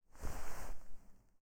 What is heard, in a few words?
winchester holster; zps; Weapons; Firearms